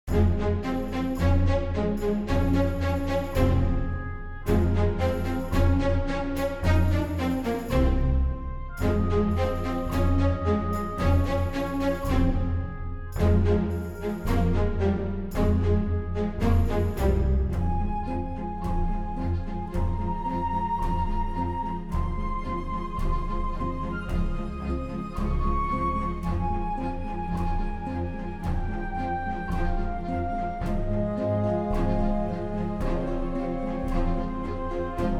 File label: music